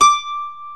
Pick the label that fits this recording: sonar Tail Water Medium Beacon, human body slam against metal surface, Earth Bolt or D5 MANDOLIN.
D5 MANDOLIN